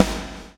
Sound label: BLOW; Spectrasonics - Backbeat